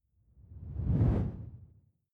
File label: whoosh simple flutter low